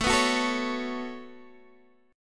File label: Db 7th Suspended 4th Sharp 5th A